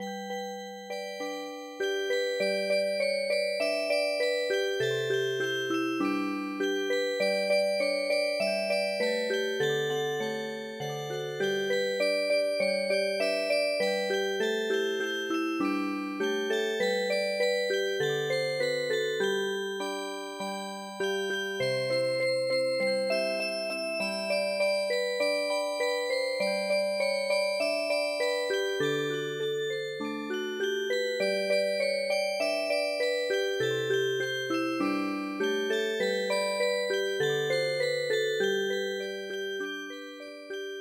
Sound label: Music Box; The Little Old Sod Shanty On My Claim